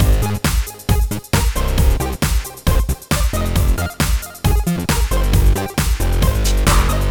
135 Full Mix, Trance; Zero-G Trance Inducer; 90 s Sample CDs

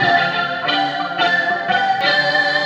Scream, Funk Keys; Sonic Foundry (Sony Creative Software); Synchro Funk

